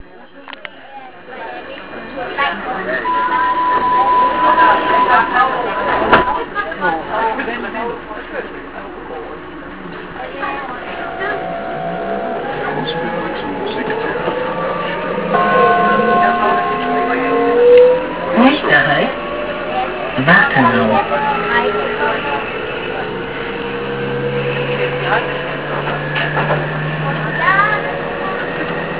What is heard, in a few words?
hamburg u1, bus